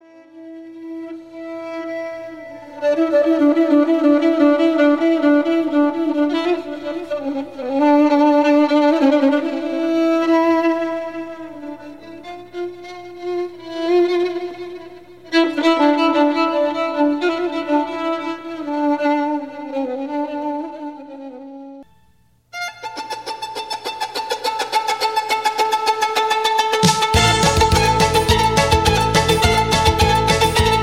Instrumental

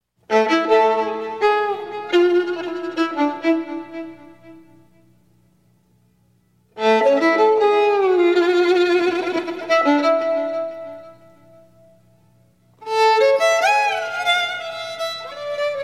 Instrumental

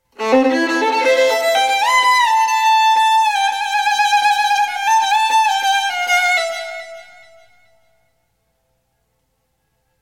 Instrumental

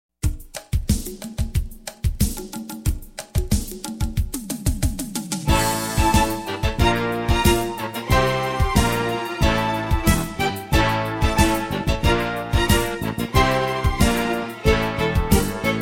Instrumental

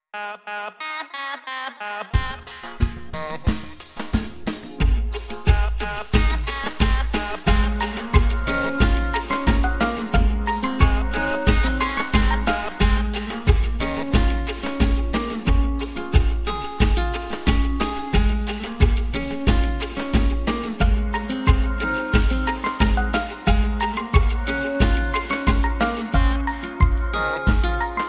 Instrumental Happy Musics

